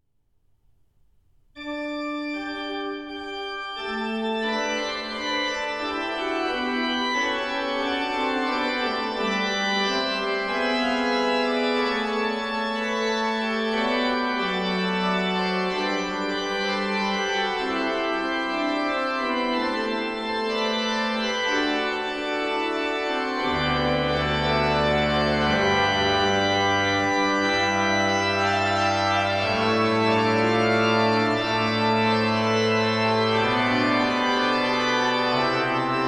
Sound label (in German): Orgel